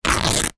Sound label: foley shorts thin material rip tear